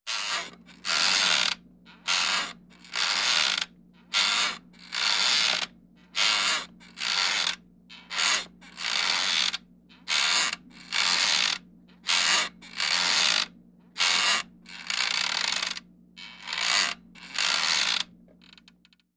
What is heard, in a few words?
Hanging creaking sound